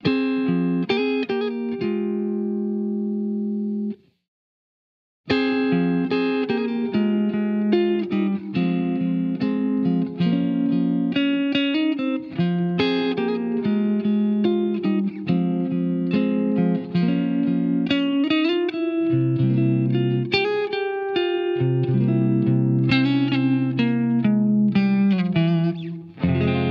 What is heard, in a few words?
Flamenco Echoes